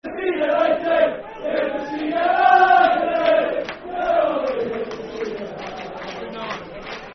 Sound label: Football Chant, Dundee United; Here To See United; Dundee United Dufc